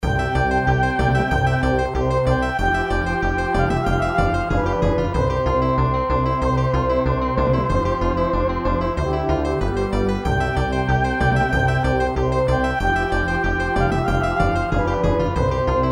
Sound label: under water; Breath of Fire